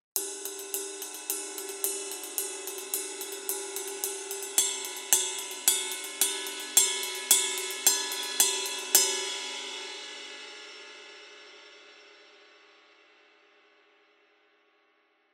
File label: Custom Ride 20 Heavy